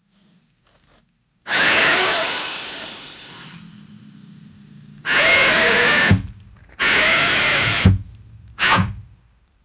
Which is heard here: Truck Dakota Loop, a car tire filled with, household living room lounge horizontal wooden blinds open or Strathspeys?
a car tire filled with